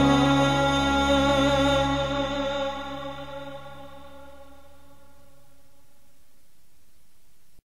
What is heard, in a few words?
Retry Jingle